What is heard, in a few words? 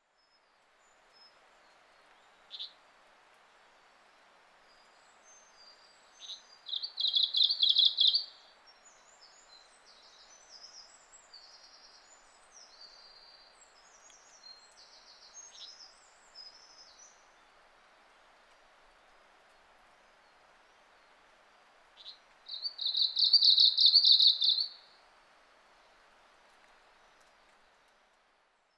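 Japanese leaf warbler; mebosomusikui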